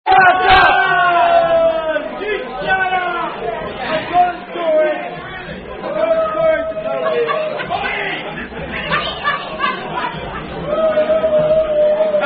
Rugby Social Walkabout, Various Rugby Songs And Chants